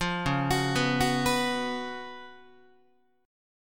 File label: Db 11th, Guitar; arpeggiated